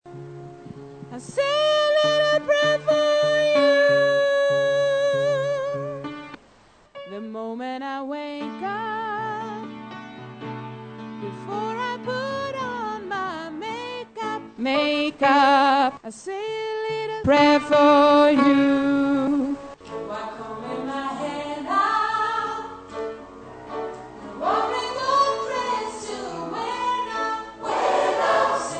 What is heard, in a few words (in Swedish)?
bas, stammor